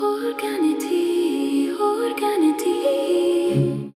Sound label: Angelic Ambient Vocal